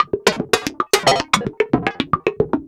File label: Woodblock Orgy; Polyend; Stazma & Concrete Collage